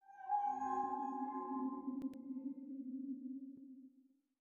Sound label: Howling; Lethal Company; Windows